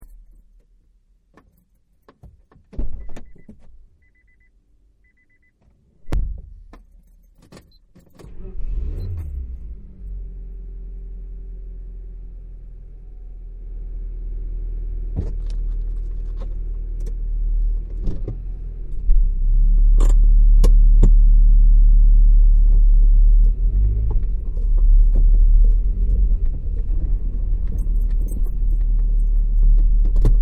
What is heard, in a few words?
Car Ride Interior Rainy; Cars; Vehicles